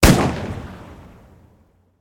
shotgun, Guns Fire; Weapons

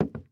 wood step, Footsteps; civ14

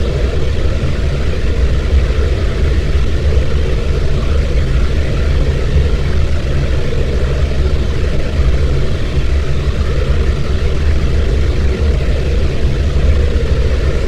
singularity

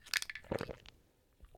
pill

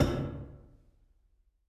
hull, Footsteps

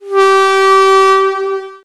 airhorn

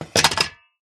welder drop

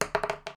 dice; Items

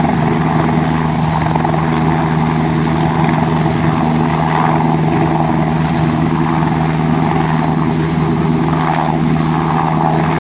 pah2 engine, aircraft; Vehicles

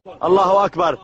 charge, Voices Emotes

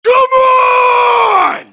male generic warcry

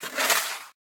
shovel dig

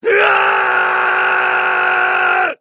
scream, Screams; Voices

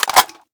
M1918 Unload; Guns Interact

